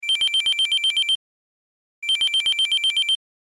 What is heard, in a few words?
Telephone; Rei Yumesaki